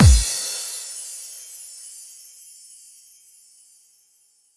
Bassdrums FX, FX Kicks; Vengeance Samples

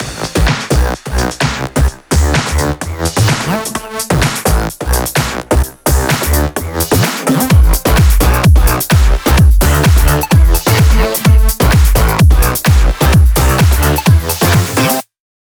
128BPM Mix; Vengeance Samples; VFH1 128BPM Meatball Kit

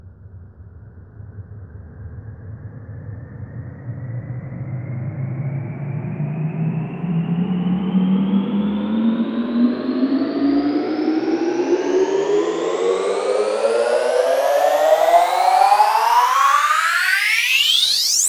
Sweeps Long

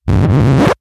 Vinyl FX and Scratches, FX Scratch

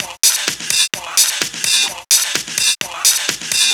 House Loop, 128 BPM; Vengeance Samples

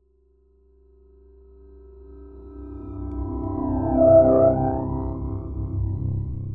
Pad; Dubstep Sample Pack

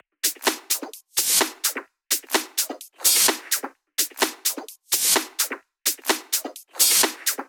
128BPM Kit; VFH1 128BPM Bones Kit

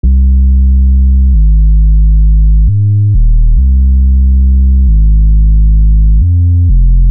808 Bassline 135; Loops

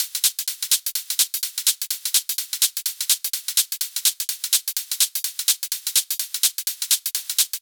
126BPM Kit; Netherworld Kit; Vengeance Samples

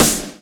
snares; Vengeance Samples

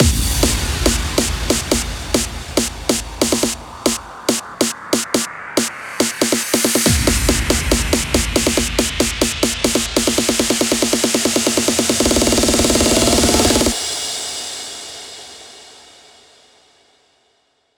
140BPM 8Bars, Hands Up Trance Fills; HansInZeAir; Vengeance Samples

